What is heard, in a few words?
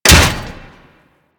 Mace Impact Metal